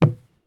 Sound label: landing wood; Escape from Tarkov